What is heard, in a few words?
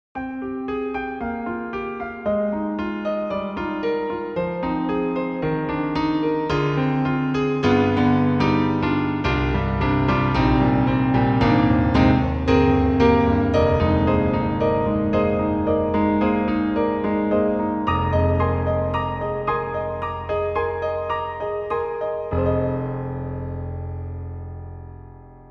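Roland Juno-Gi Stage Piano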